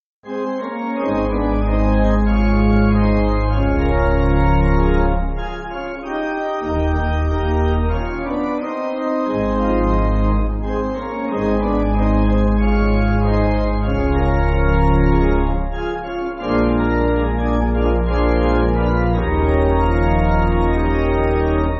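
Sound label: Pipe Organ, church backing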